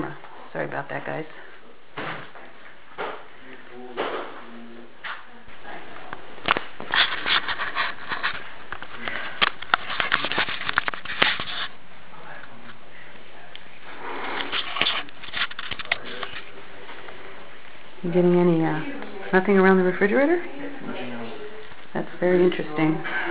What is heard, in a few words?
whisper